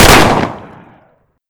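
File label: shotgun double fire; hl2dm; weapons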